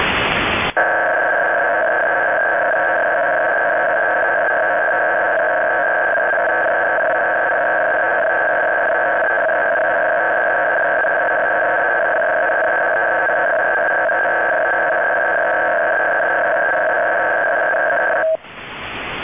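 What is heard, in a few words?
audio frequency-shift keying 400-960; 10210khz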